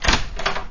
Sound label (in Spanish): No Abre Puerta; Dinamic Multimedia 2000